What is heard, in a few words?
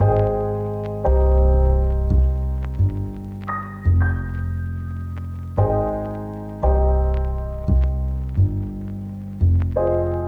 Lofi Melody Loop - 86 BPM C Min; Melodics; Cymatics - Eternity Sample Pack